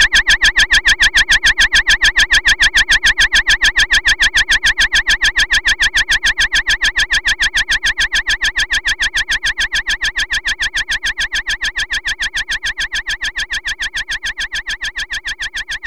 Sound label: NOISE FX